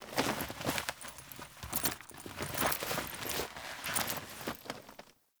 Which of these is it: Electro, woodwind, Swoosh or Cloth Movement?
Cloth Movement